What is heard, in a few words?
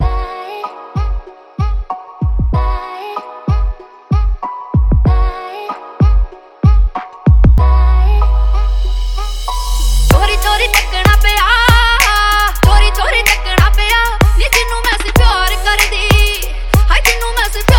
Dhol Remix Song